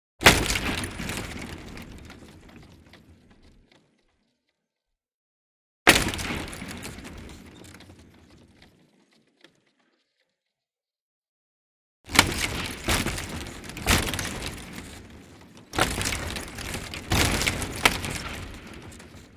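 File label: hit against the boards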